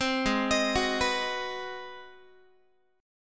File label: Ab 7th Sharp 9th down Guitar; Ab7#9 arpeggiated; 15,16,18,16,15,x; 4A,4E,5A,5D,5G#,6C#